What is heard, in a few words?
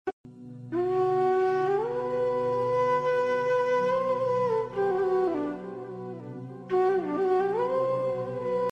sad pakistani flute ringtone